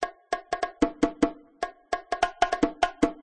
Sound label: Cave Drums; Safari Cave